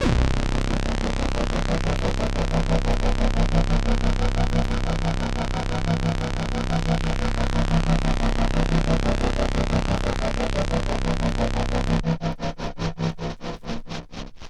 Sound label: Sync Chopper Vinyl Synths C0